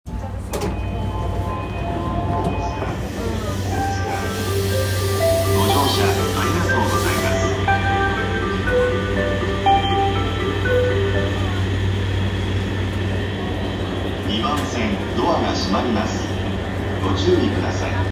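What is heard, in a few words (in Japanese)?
山手線 大塚 clockwise, train sounds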